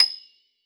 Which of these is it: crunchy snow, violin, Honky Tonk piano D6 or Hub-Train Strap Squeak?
Honky Tonk piano D6